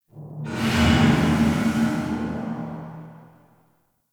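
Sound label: Large Cargo Room Random Ambience; Warframe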